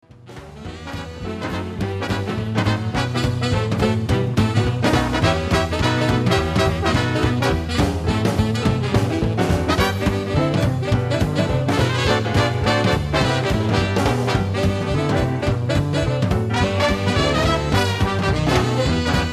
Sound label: big band